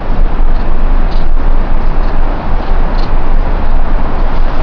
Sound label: country day; PC Gamer IT CD 41; Daikatana